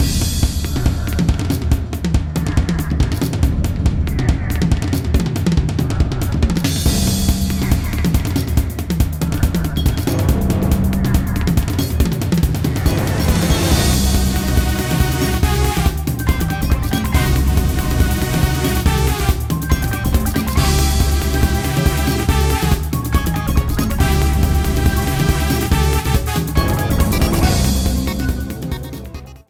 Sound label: Battle; PMTTYD; Hooktail